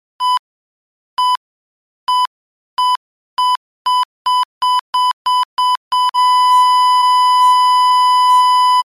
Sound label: flat line